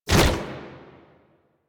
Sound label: Tank Infested Arm Move Fast; Enemies; Movement; Warframe; Lasrian